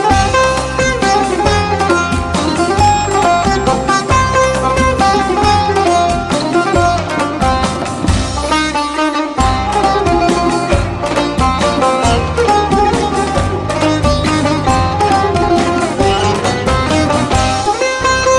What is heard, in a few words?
Tar